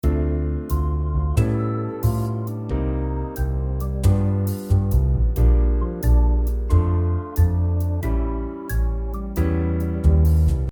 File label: jazz